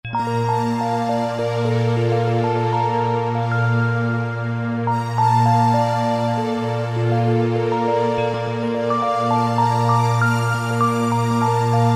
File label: Choir & Pad, Yamaha PSR-3000; Factory Voices; TimeTravel